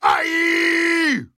Aieeeee

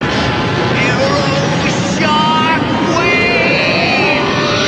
Arrow Shockwave

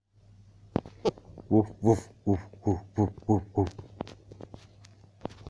Woof

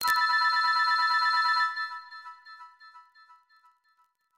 Low Nokia 6100

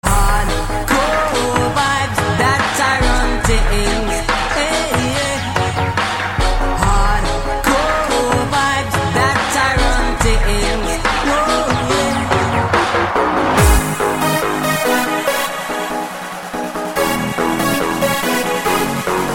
Hard Core Vibes

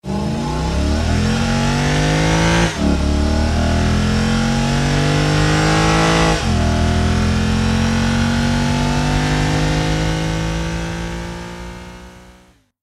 Lexus LS F Sport

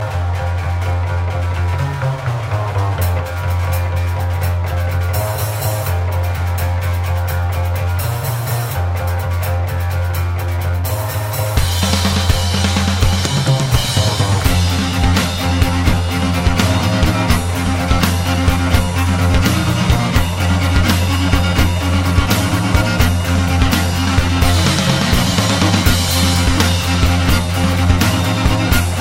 Folk Metal

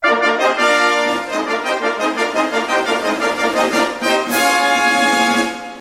Fanfare

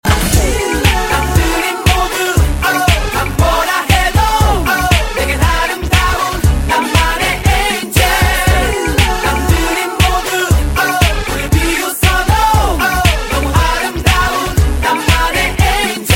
Angel Chorus

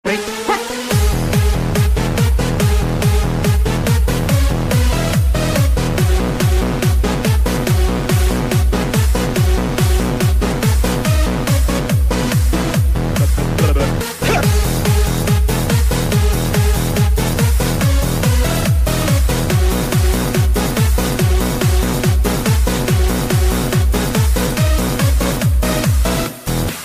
Rave Mix

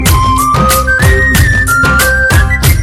Message Sms

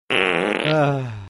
Fart Aah

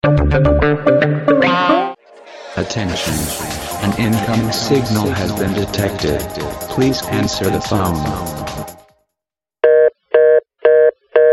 Microwave Sensor

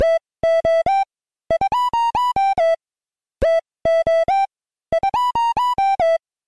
8bit

